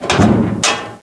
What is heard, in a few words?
LOCKER DOOR OPEN